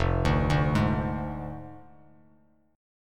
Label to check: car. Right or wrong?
Wrong